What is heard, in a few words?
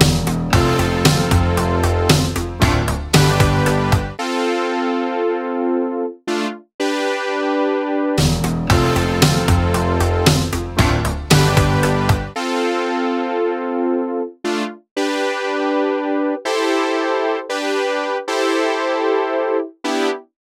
Brass